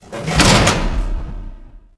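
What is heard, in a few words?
door Hangar Interior end; theed; Star Wars Obi-Wan; XBOX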